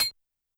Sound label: Glass Noize H; YAMAHA RM1X